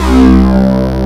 NOCHN SYNC, TWAV BASS; Soundengine - CSR-000 The Art of Transwaves

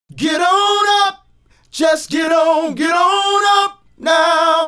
Male Group, Vocal

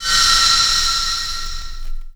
INDU STEAM, Synth FX Misc

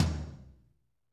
TOM, Roland; Northstar - Drumscapes Roland

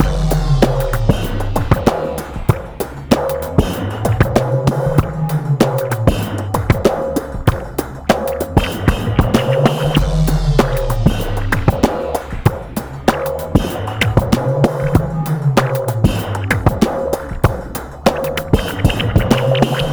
42 96.3 bpm, Loops Breaks; POTBreaks

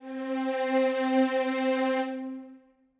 violin leg C4, Strings; M8; BBC

